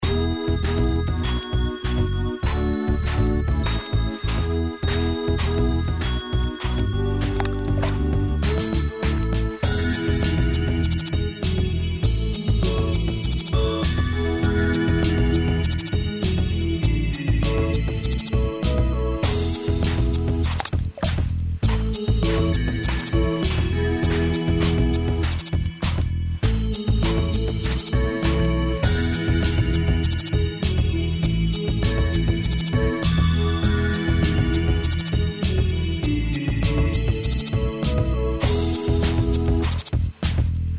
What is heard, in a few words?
Bgm Snow; Adventure of Heroes; Android